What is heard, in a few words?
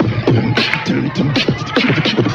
Beatbox; Drum loops